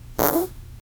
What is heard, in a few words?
the sound of a fart